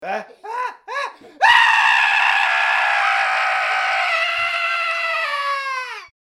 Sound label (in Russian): visg muzhsk, zvuki vizga cheloveka